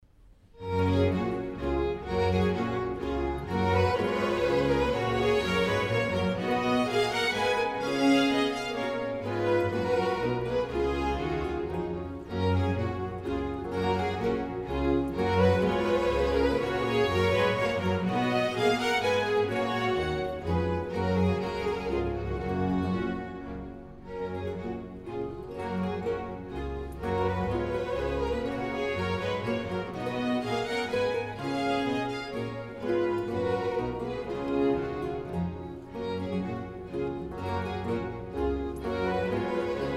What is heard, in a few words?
Menuet; Handel; Passacaille, Gigue Menuet in G